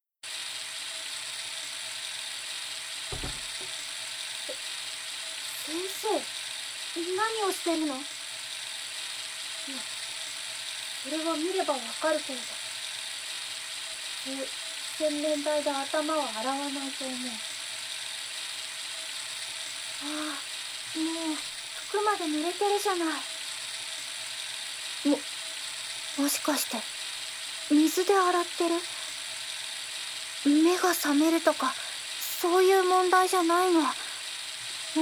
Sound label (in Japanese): ぎこちないシャンプー